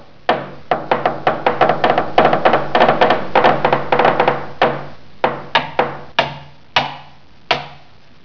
Haemmer

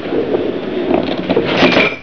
UBAHN

